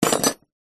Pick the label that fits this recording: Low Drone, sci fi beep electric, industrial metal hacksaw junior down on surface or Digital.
industrial metal hacksaw junior down on surface